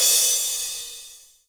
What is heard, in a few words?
Drum Finish